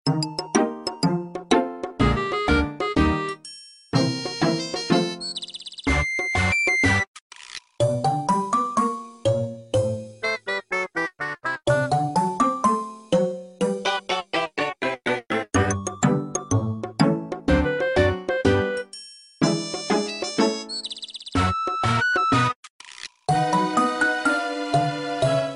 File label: Slow and Steady